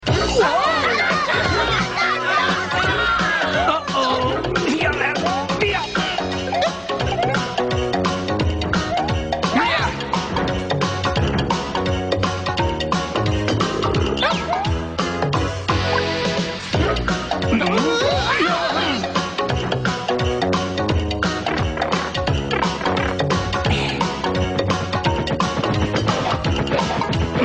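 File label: Rockin With The Sleigh Bells Instrumental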